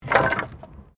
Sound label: Wood Move